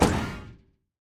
Item Dropship Open Doors; Lethal Company

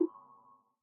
Button Press

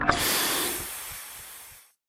Release Helmet Gas

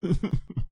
Mask Cry

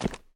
rock

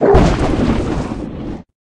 lunge

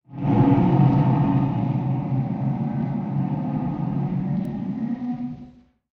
Anger At Desk

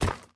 Hit Dirt

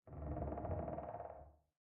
bump outside ship

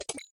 Press Landmine

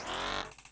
Turret Activate

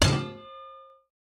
shovel hit default; Lethal Company

